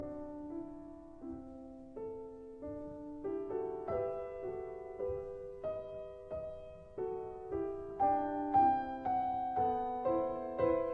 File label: Fugue in E major; 6 Preludes and Fugues